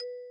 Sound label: sound enter